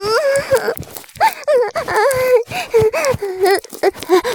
絶頂, ASMR; 騎乗位

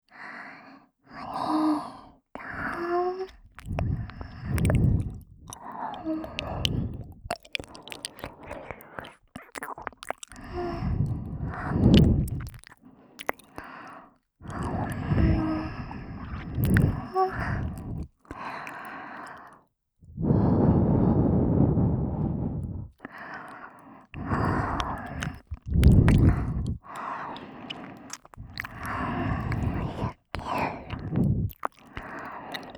耳舐め・右; アリス; 把萝莉魔法少女催眠成最喜欢谄媚奉仕脏鸡鸡的女孩的催眠APP♪

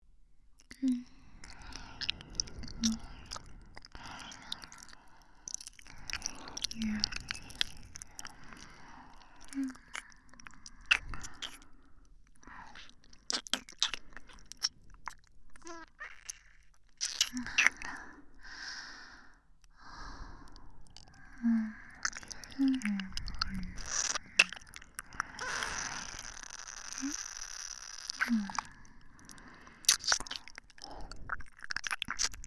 左右同時耳舐め

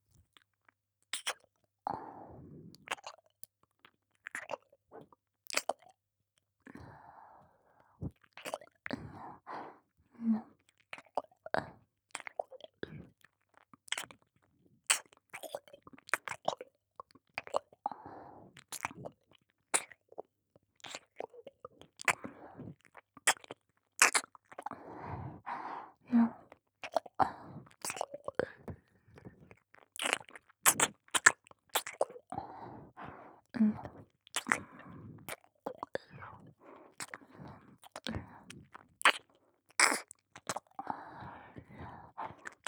左 耳舐め, ASMR; 癒やしメイドと低音クール女執事の超ドスケベ変態ご奉仕 ～筆おろしからひたすら濃厚溺愛エッチ!～

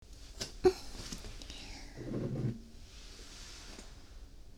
コップ 取る 音; NG集; コミケで手に入れた戦利品でのオナニーを女性が一緒に見てくれているだけの音声。～2023ver～

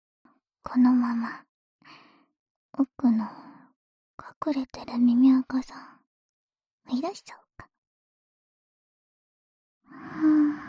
【左】奥耳かき

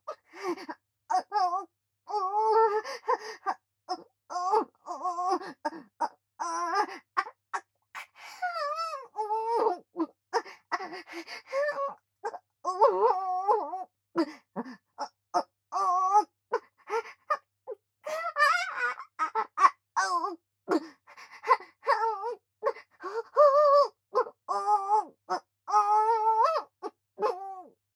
喘ぎ声・激しめ

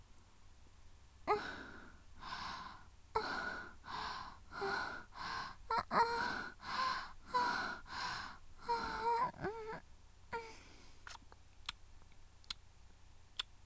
キス; 【マゾハーレム】

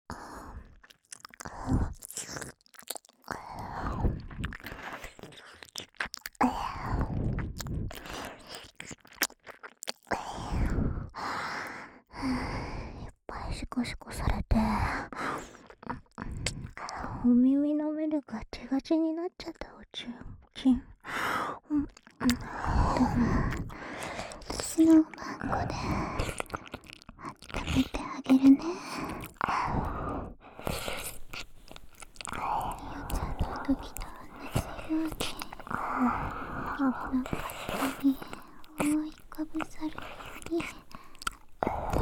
深い耳舐め（左耳）; 美早と騎乗位エッチ; 究極耳舐め; プレミアムフォーリー

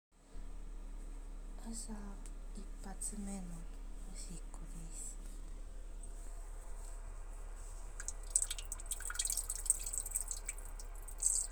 朝のトイレでオシッコ

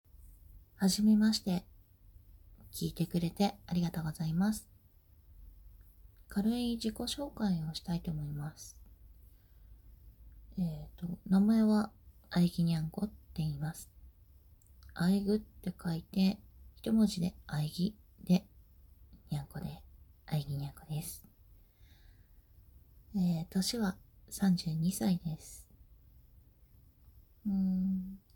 初ガチ実演オナニー; THE FIRST ORGASM; おまんこ壊れるまでオナニーしてあげる...ちゃんと聞いてよ...

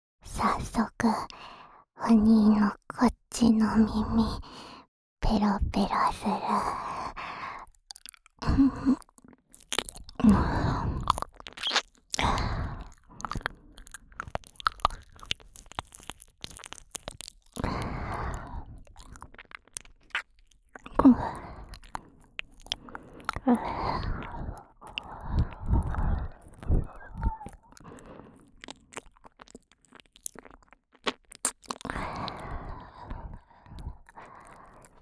癒しの耳舐め（右耳）, ASMR; 琴乃; 究極耳舐め; 幼妻姉妹と送るいちゃらぶ新婚耳舐めASMR

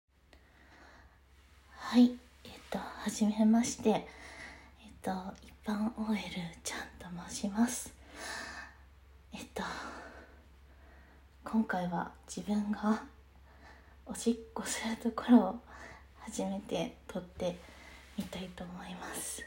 おしっこ, お風呂場で大量失禁しながら絶頂; THE FIRST; 一般OLちゃん

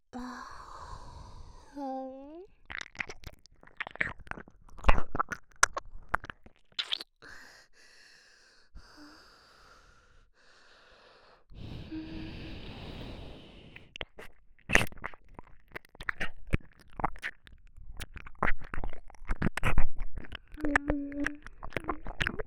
耳舐め ママ 左; ボーイッシュなラバースーツ彼女に赤ちゃんにされて再スタートする人生

